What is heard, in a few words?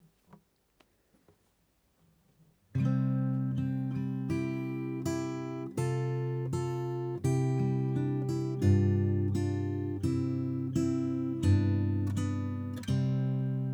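Guitar melody Neck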